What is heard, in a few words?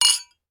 household glasses wine x2 clink; sound effects one